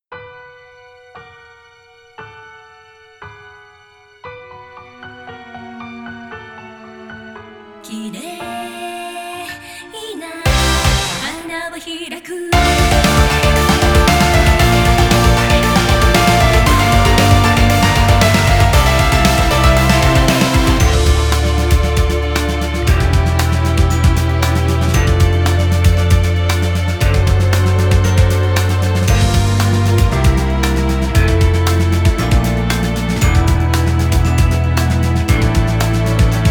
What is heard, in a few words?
karaoke ver; yuukoku no femme fatale